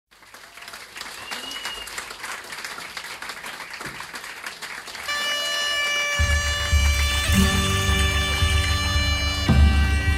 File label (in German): palaestinalied instrumental live